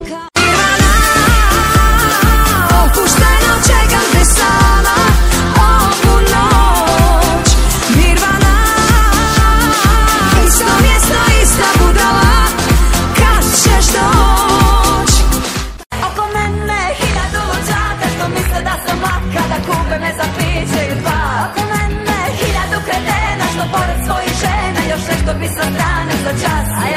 satisfying croatian

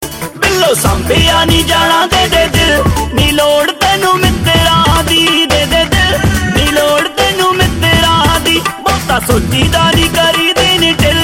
punjabi ringtone

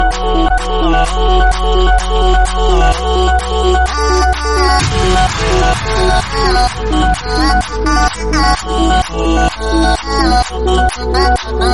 dutch house

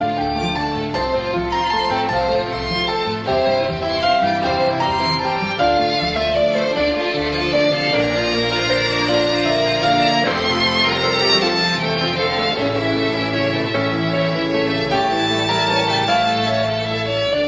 music ringtone; qatar airways

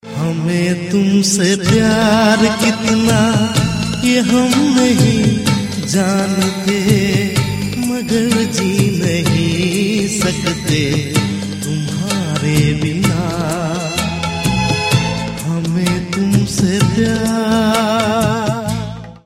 hindi

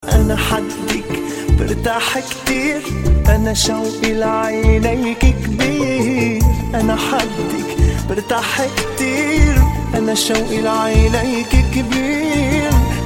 arabic love

